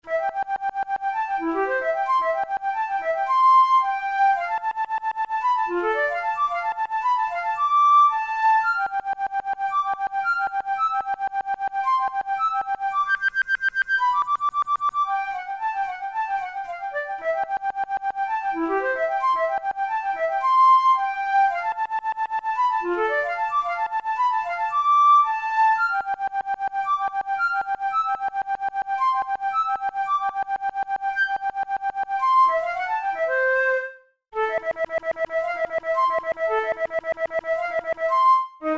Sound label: double tonguing, flute; kohler op66